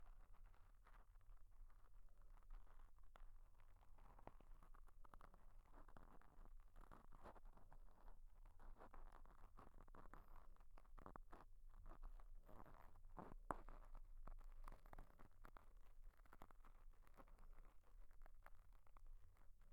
きめ細かいスポンジ耳かき, 左耳

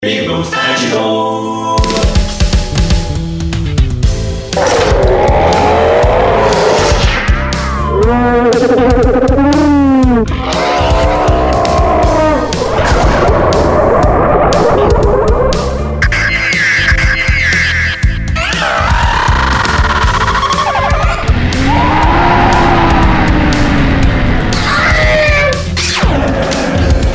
怪獣王; 効果音素材集; ぴぐみょん効果音素材集030; ぴぐみょんスタジオ

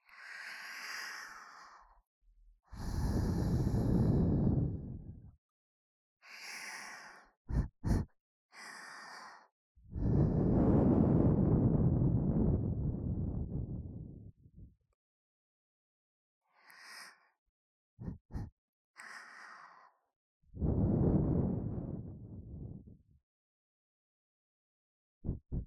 お耳ふー

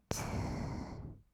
吐く音, ブレス, kawaii voice; ASMR

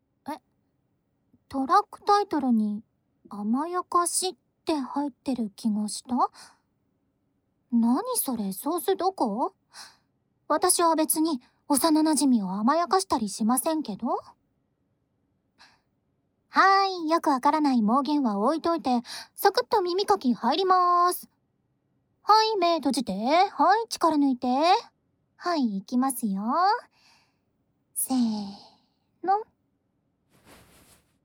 「甘やかし耳かき・左」, ASMR

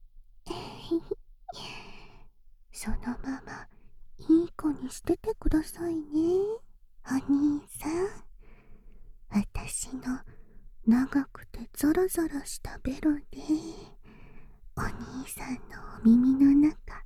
ねっとり牛タン耳舐め （囁きブースト）; ASMR; 効果音あり

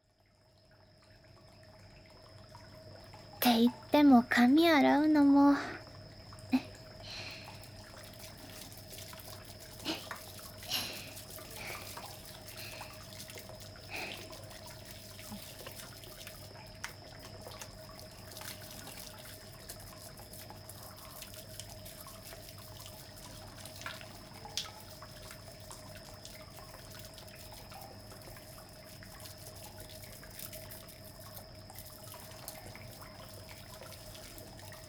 シャンプーもひのき泥炭石鹸で。シャンプーしながらのブラッシングは、柘植の櫛を使って, ASMR

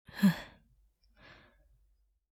ふっ…; ASMR; Windows等向けシステムボイス

